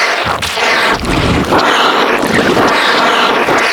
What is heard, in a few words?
Death Wall Loop Interior; Evolve; Elder Kraken